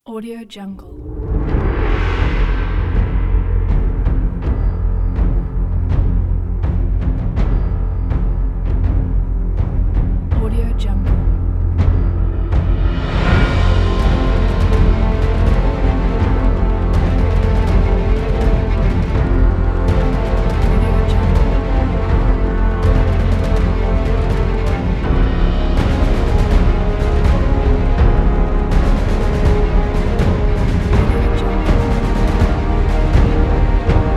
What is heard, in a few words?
cinematic heroic battlefield background